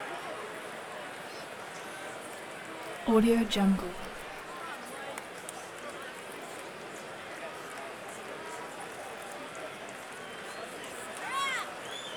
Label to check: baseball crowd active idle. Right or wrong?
Right